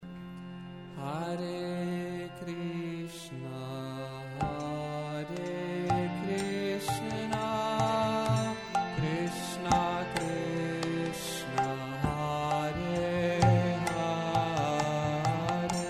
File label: Hare Krishna Raga